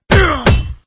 dwarf warrior roar

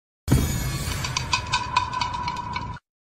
shade button; rpdr

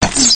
arrow

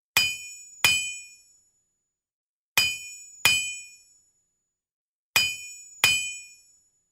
blacksmith hammering

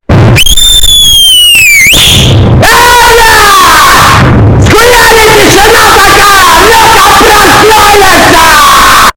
asmr

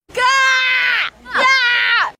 scream

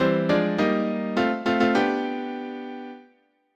piano winner

